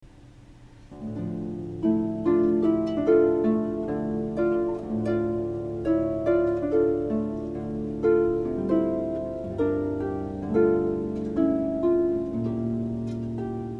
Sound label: French Folk Song